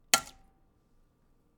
Egg Shell Cracking

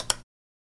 Start Stop Button

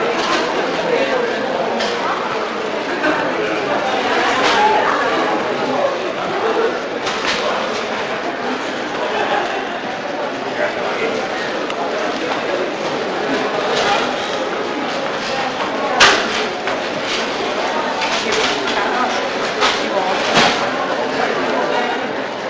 Street

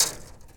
Icebreak

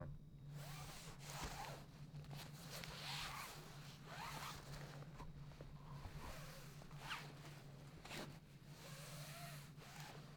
Sleeping Bag

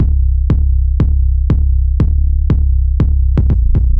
Breakbass 120bpm

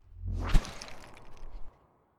Rocky Dash

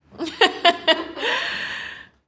Female Laugh With Inhale

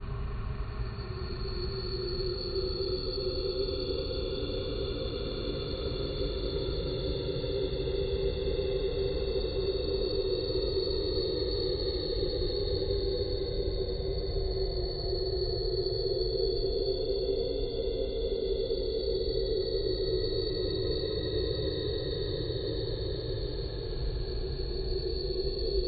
Horror Ambience

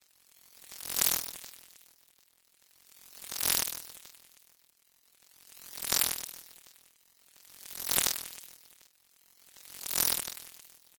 Electronic Flys

